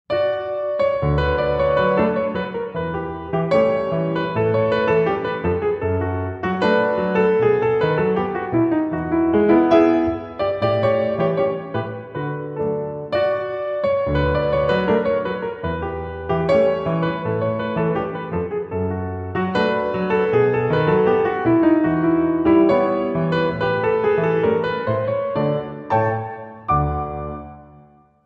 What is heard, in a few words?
Tango; El Porteito